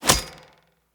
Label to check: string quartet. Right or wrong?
Wrong